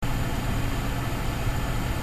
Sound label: fan